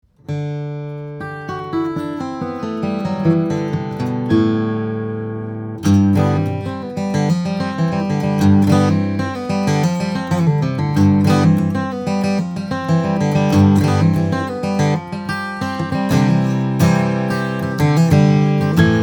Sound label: Gibson J-45, guitars; 1967; j-45adj; mahogany; spruce; steel string guitars; flattop